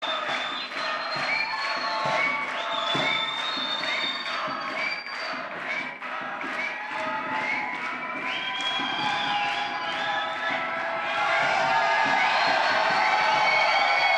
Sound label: Banter Crowd